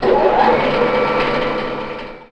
lift doors open

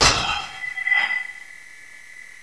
lady pain

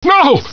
hurt

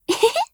ump9 jump

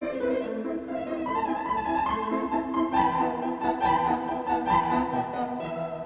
music

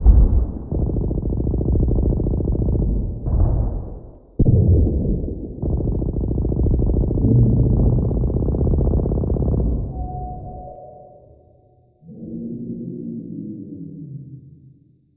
distant fighting

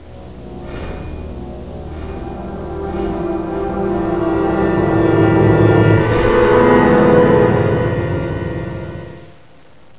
Song

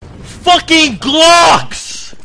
glocks

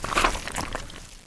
spore launcher reload end

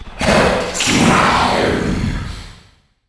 growl

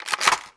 tmp stock

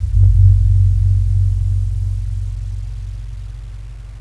bass

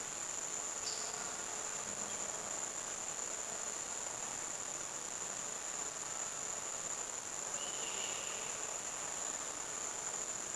amb destroyed elevator

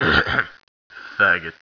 drill

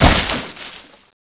vine

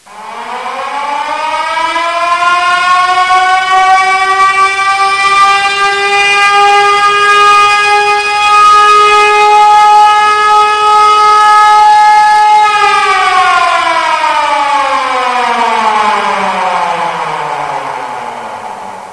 alarm; city17

